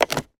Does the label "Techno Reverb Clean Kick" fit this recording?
No